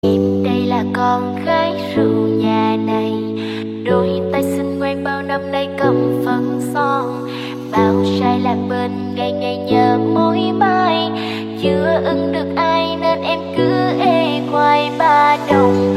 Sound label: Lofi